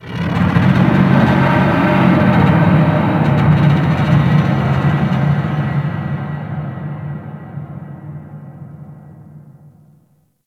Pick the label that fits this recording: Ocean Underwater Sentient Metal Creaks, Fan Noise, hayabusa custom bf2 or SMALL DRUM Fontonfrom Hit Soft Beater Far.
Ocean Underwater Sentient Metal Creaks